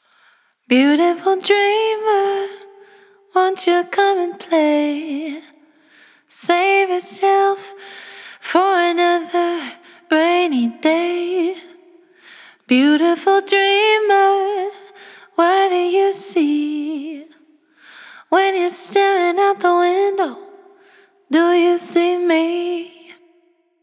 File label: Vocal Plate